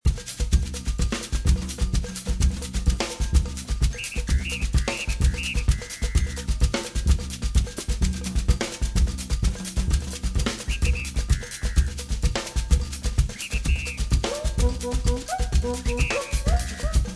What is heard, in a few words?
Loading Loop; The Sims